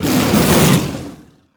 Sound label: Cat In A Hat Attack